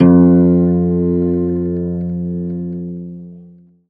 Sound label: ZITHER BAS; F2; Best Service; Alpine Volksmusik